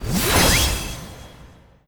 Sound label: Enemy Ghost Gimmick Light